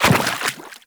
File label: Step Water, Footsteps; Enemies; Warframe; Teshin